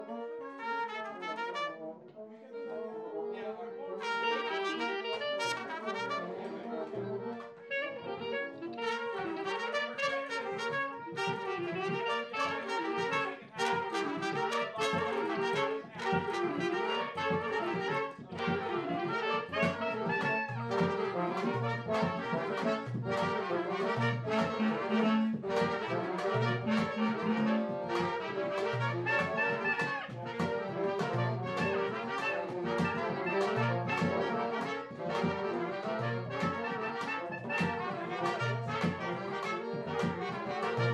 thailand shuffle rehearsal

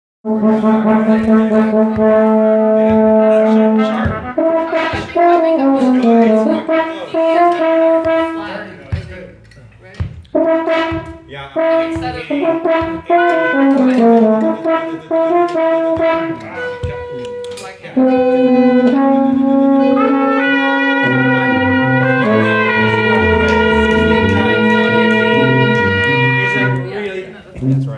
mid brass, Rehearsal Recordings

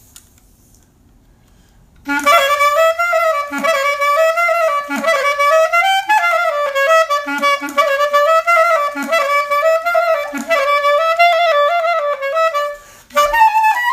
Yevanic Tantz Clarinet

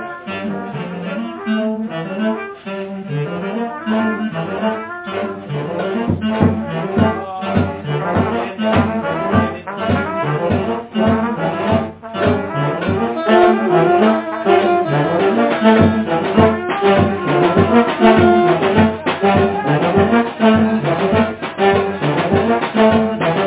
thailand shuffle A with drums

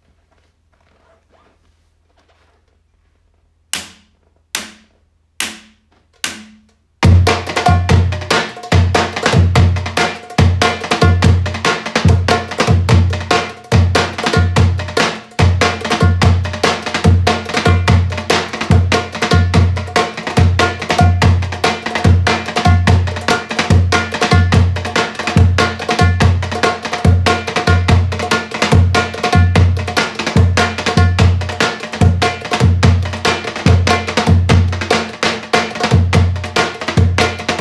Maksoom 72, Drum samples

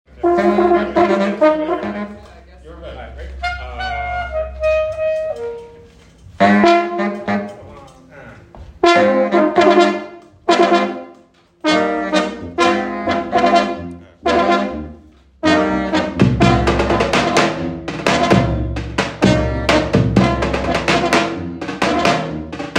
Banishko solo groove

